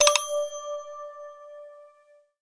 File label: Coin Experience; The Croods